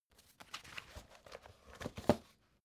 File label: folder page turning soft